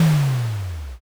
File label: earth magic